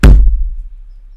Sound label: hit